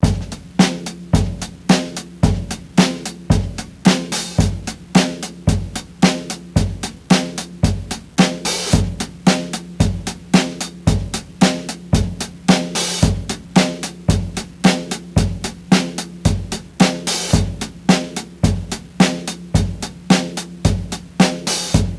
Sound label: rythm, drums